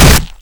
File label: beast strike; morass beta; morassbeast